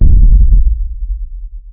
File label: explosion rumble; War Thunder